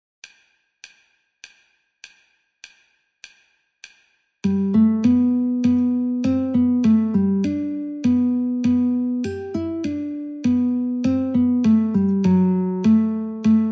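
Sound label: G Guitar metronome 50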